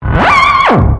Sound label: hip hoppity gliss up down; Punches Hits Discoblasts; single hits